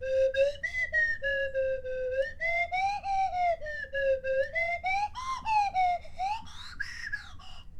Random Stabs Slow, Slide Whistle

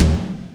Big Donk, Drums

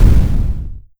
explosion; NIIIEMAND's explosion sfx

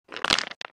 stones; RPG

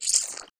alien; CC0 creature sfx 2

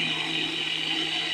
machine; 30 cc0 sfx loops

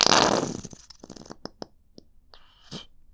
Plop Sputter, Bottle Plops

